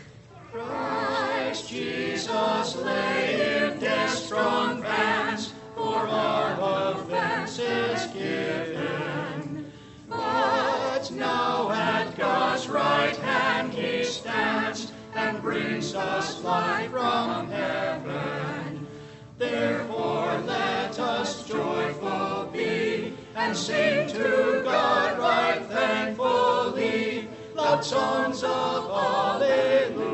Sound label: Choir